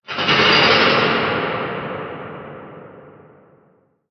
abyssal hammerhead idle; Barotraumatic

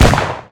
heavy ammo explode; Weapons; EK modern; Barotrauma

